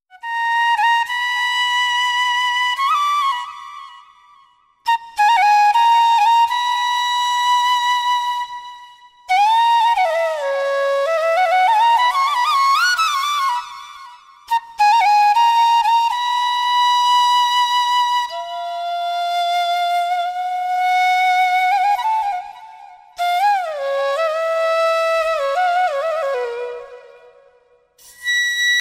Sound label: malayalam aroflute